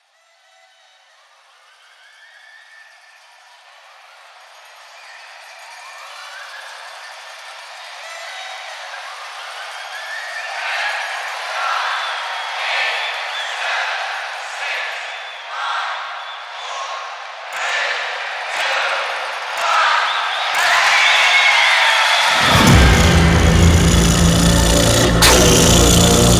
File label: Outro SFX End; Warframe; Entrati Labs; 1999 Outro Cinematic